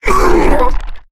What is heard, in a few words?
Thorn Wound; battlerite